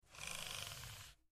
Short Mouse Slides

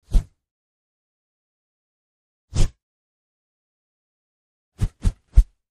Swish Deep 3x

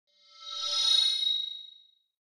HF Elect Wash Reverb

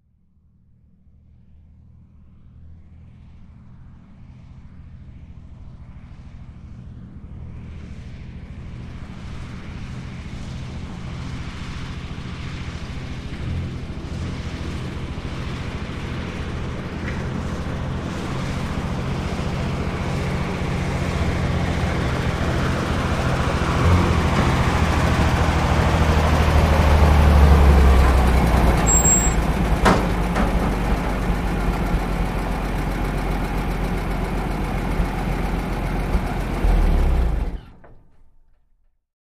Truck 2.5 T Slow Idle Of; 2.5 ton

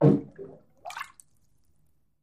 Bathtub Fart Shrt S

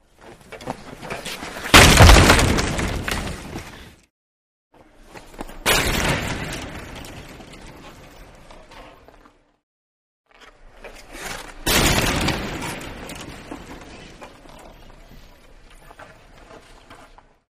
Hockey Body Check, hockey player hits board

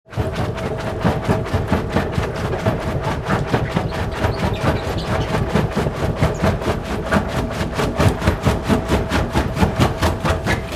Rock Crusher Running, factory

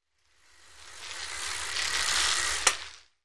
Biking Bike By Spinn

